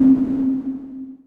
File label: Roompop, wavetable mallets; Instruments; Ableton; Synth Essentials